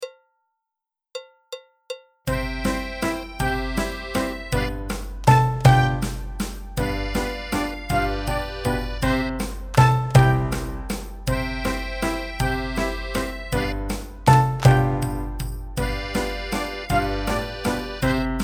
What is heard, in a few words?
Mex clap Backing Track; The Mexican Clapping Song